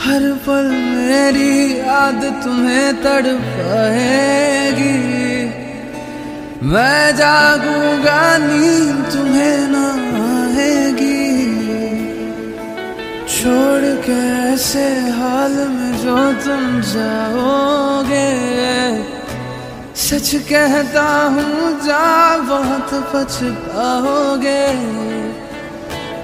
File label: very sad status ringtone, bollywood ringtones; whatsapp